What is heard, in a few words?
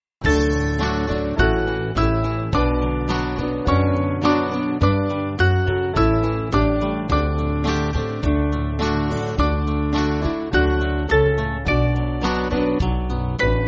Here O My Lord, Band; Peel Castle